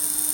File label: TOY ROBOT MOVE LOOP